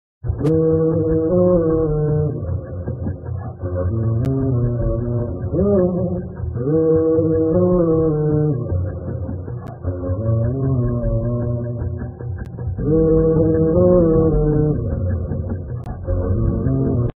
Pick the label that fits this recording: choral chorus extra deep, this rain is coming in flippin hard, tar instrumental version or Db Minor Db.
choral chorus extra deep